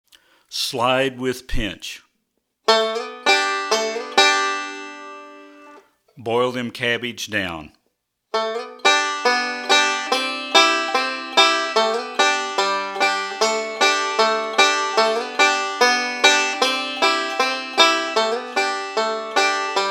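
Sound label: Slide With Pinch, banjo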